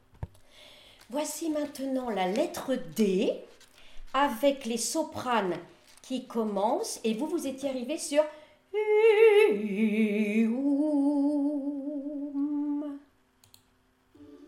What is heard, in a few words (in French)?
Basse Orchestre; Magnificat Pachelbel